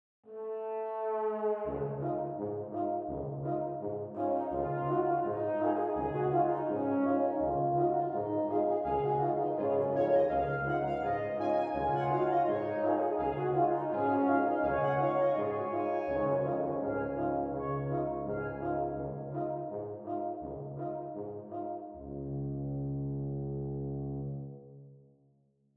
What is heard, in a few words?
Breton Brass Wind; Gauguin – a dangerous life